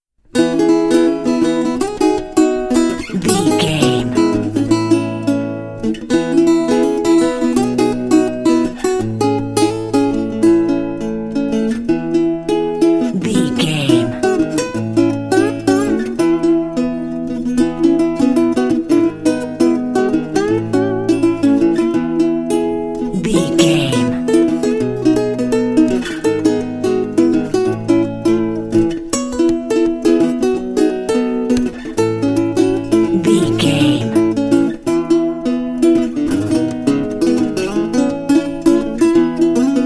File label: little mountain diddy dobro only mix